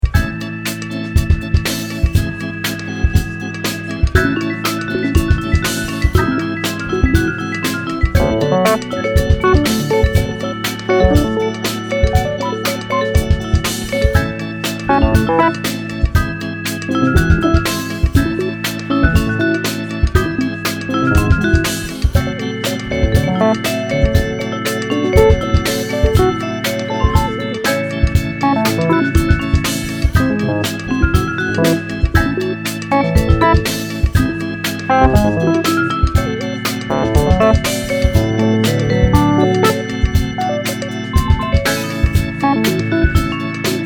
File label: organs; thinking through organs